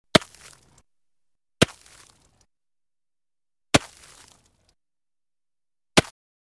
Chop Ice On Lake Shore, Axe Or Pick Into Solid Material, Some Ceramic Tone 4x